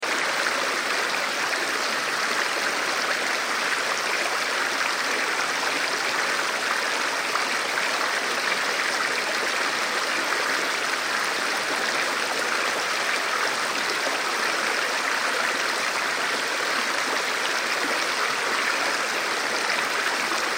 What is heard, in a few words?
Stream Run River, Stream Run River Flow Loop; Nature; Water; River Sound Effects; Blastwave